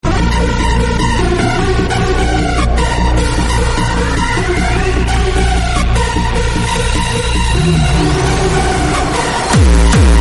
bass booster song

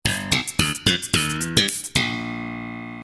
sitcom transition bass riff; seinfeld

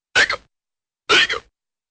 higgup higgup

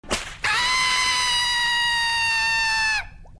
screams

